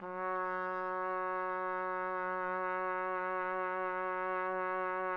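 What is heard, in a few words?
TPT, trumpet; Roland - LA Composer vol. 3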